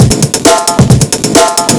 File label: Loops, breaks